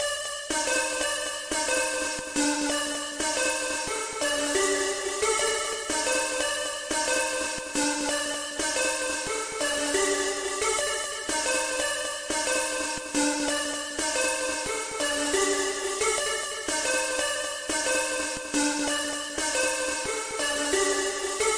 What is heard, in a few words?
synth; On A Roll